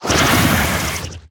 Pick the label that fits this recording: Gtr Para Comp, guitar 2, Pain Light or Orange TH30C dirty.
Pain Light